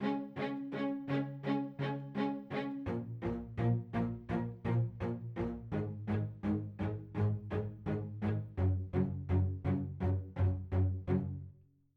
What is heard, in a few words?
pad